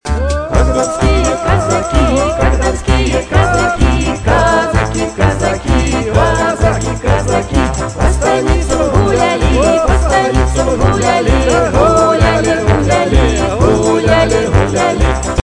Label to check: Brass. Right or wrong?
Right